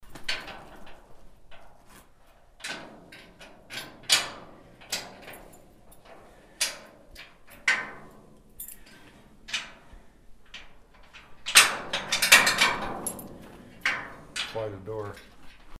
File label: door opening